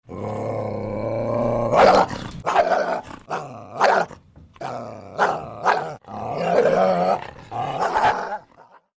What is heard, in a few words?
Single Coyote Barking Cu W Growls And Snarls And Chain Rattle.